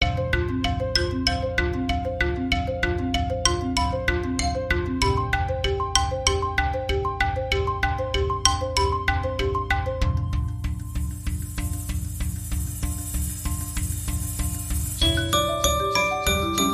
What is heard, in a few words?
Playful Marimba